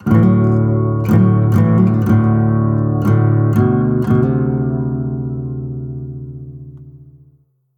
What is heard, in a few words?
complete sting